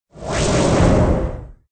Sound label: chain; Fight the Landlord